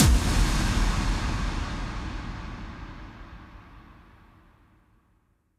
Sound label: 144 BPM Fx Kick; Vengeance - Essential Clubsounds Vol.5; Flight Kit Root Amin